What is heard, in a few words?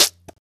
Spit; Lewd SFX